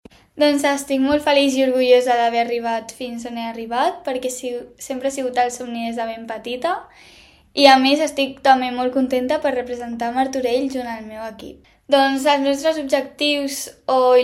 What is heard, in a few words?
HIP HOP; mundial de hip hop a arizona